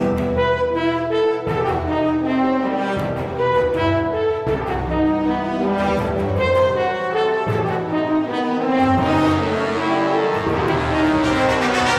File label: Battle Loop; Infinity Blade 3